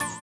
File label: VIBRA; Spectrasonics; Metamorphosis